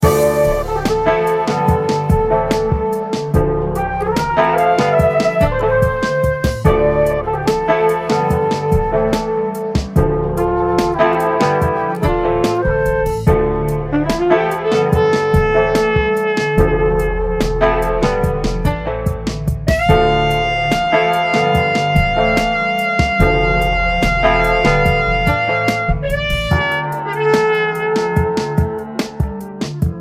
Funk

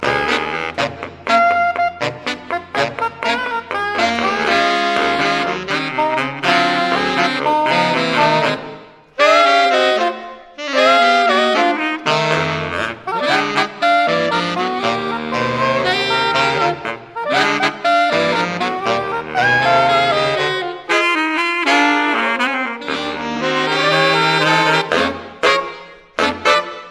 Sax Quartet

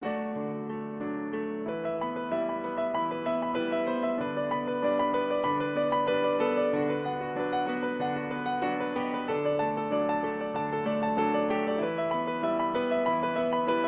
Piano tune

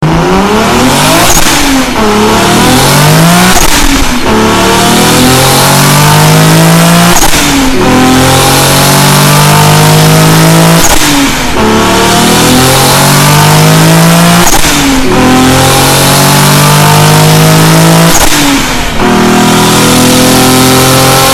Skyline Turbo Sound

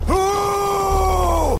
WHOOOOOOOO; Mgs 5

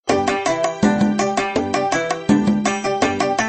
Indian Classical

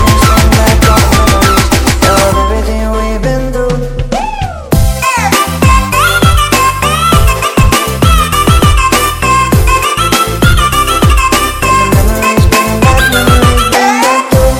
Cello

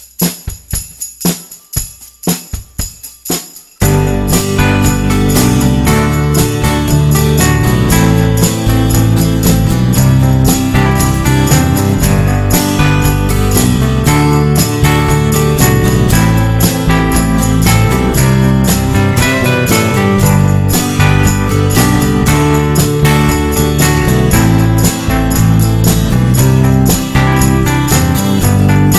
Classic Rock

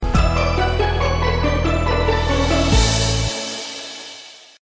Nokia Tune

